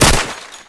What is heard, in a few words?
machine pistol; minetest